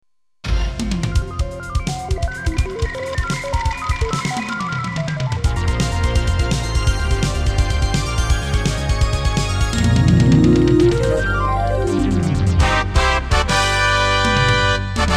电视包装音乐

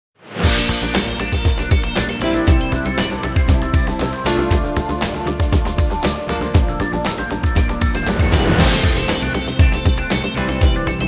电视包装音乐

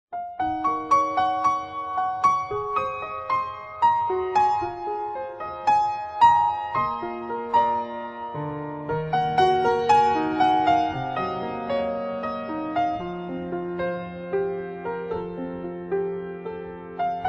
彩铃背景音乐

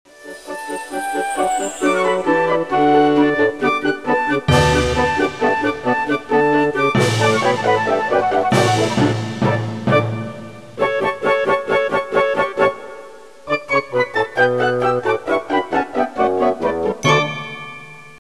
片头音乐

